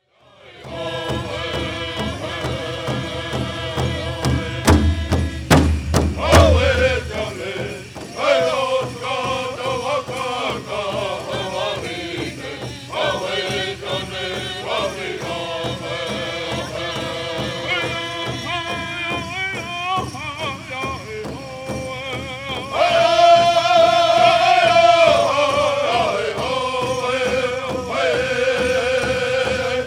Otoe Intertribal Song

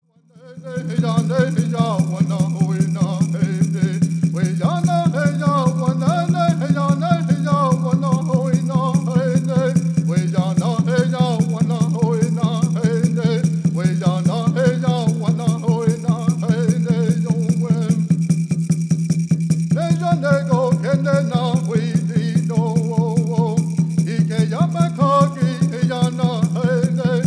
Peyote Song

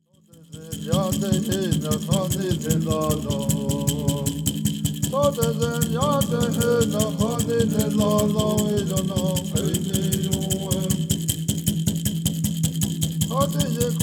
Song

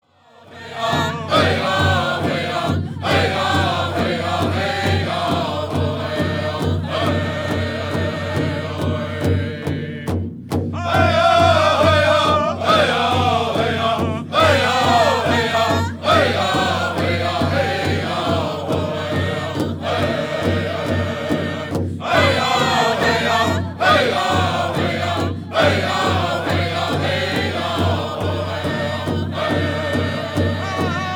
Intertribal Song

